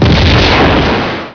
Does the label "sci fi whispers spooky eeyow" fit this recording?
No